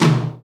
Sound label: TOM, KIT Real-Kit